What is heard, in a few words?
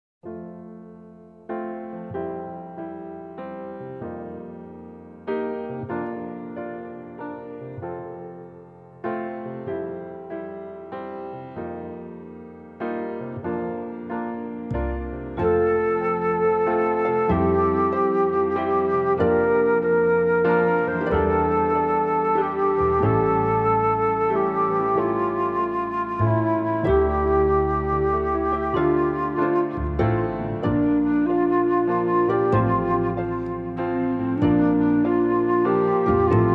acoustic praise, instrumental